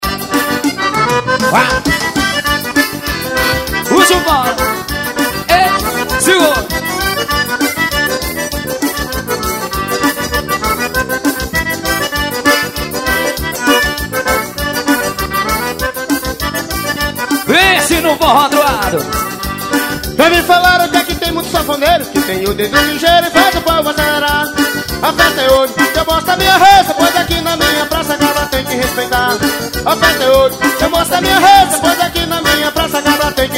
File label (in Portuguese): acordeon